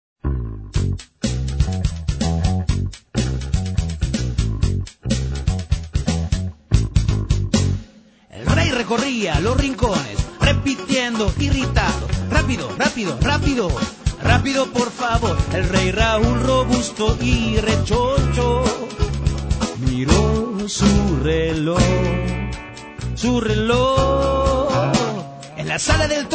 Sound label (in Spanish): Rap Ratas